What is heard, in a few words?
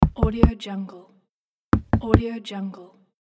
a knock on a door